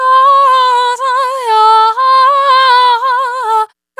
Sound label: Compulsion Dry, Vocal Samples, Loops; Sonic Foundry (Sony Creative Software) - Pocket Diva World Class Vocal Samples; Op-Erratic Loops